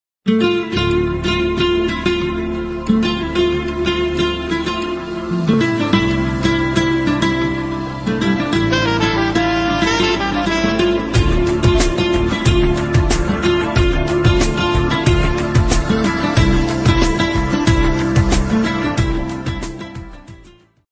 instrumental ringtone, love ringtone, romantic ringtones